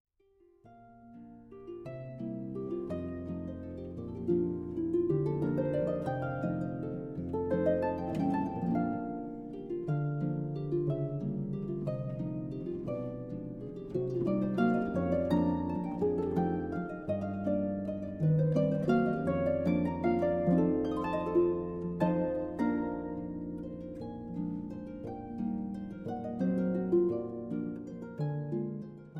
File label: Harp; Snoer; Capriccio